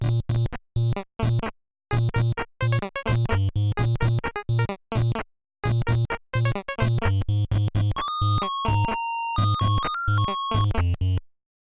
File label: Level Win; Super Donkey Kong 2; nes